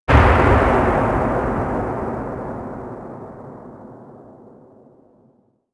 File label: explosion mid tail; World of Tanks Blitz